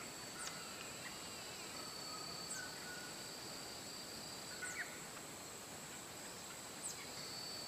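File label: Purple honeycreeper, bird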